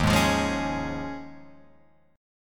D sharp 7th Suspended 2nd Suspended 4th down Guitar Open F; D#7sus2sus4 strummed; JGuitar